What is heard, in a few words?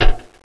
clang